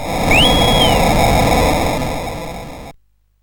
sid CSG8580R5, GAMES; Card Sharks

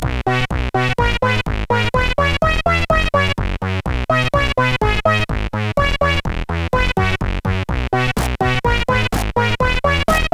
Christmas Tune, CSG8580; CSG8580R5; hvsc

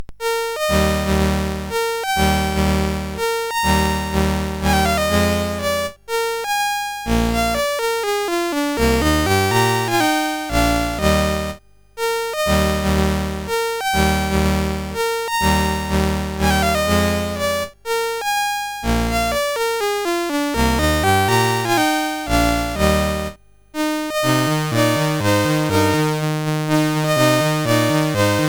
Minuette sid CSG8580R5

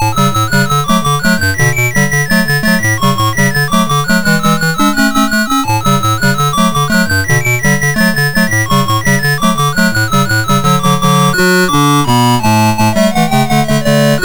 BASIC, MOS6581R4; sid; Orfeus i Underverdenen

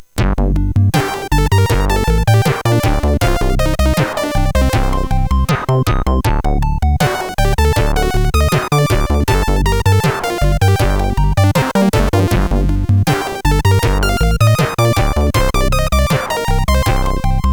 My Funky C64, CSG8580R5

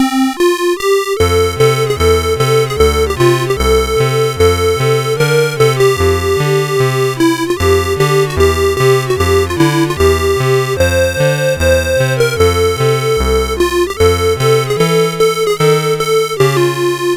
CSG8580R5

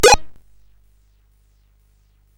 Tecnetium, CSG8580R5

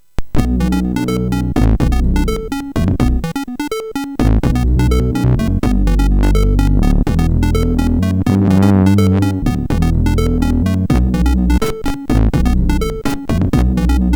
Game Music, MOS6581R4

